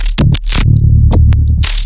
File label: weird bass